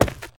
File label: tile, footsteps infected walk; player